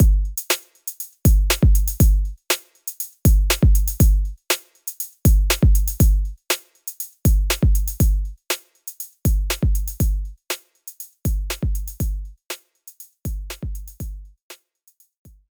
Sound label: BGM Opening Tatami No intro; clubhouse games 51 worldwide classics 2020 switch gamerip